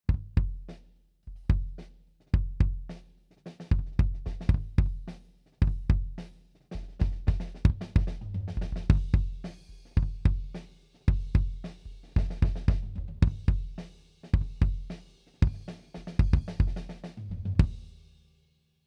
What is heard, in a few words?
Kick, Head Off 2cm D112 RRR